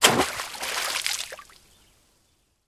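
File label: sounds of anchor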